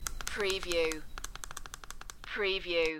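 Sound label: Electric Button Push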